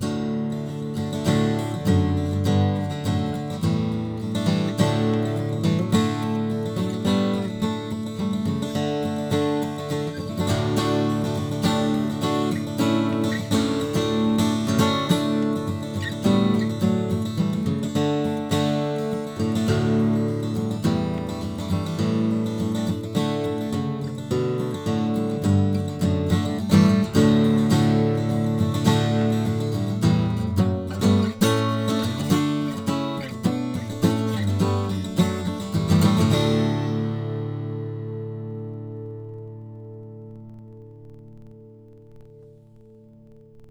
Model J Mahogany DADGAD; Jim Wood

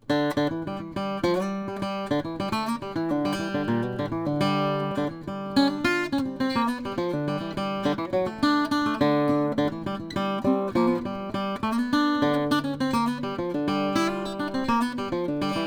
Gibson L-00, guitar; Chris Bozung; 1934

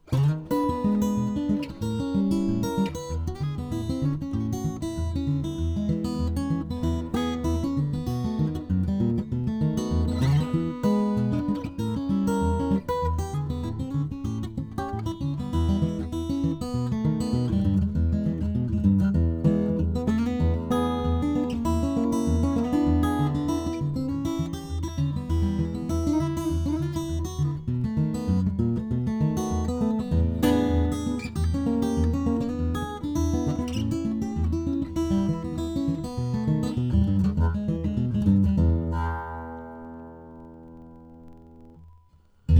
1939 Gibson J-35, guitars; Gene Bush

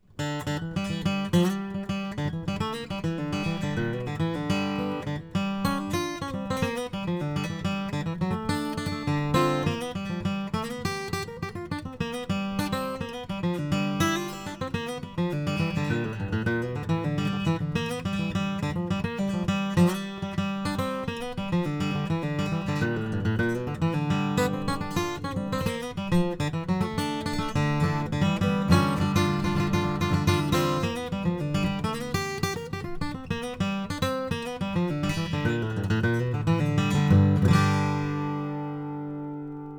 Herringbone Mahogany, guitars; Chris Bozung

